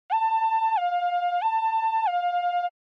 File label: Ambulance Siren (Dry)